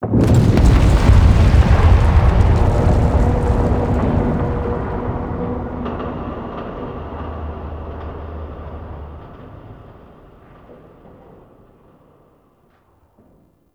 Large Arms Move Start; Ambience; Gameplay; Warframe; Duviri

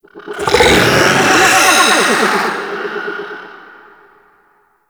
Pet Vocal Call; Warframe; Alad V Pet

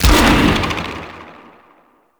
Dragon Elemental Breath Projectile Cast; Warframe

